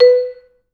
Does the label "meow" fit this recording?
No